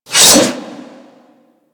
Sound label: Great Sword Swipe